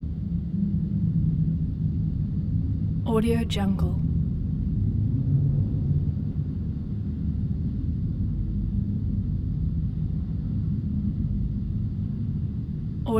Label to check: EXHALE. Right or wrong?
Wrong